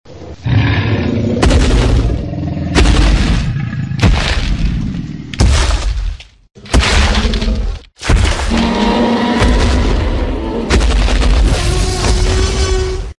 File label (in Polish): Tyranozaur